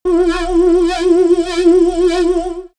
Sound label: alienation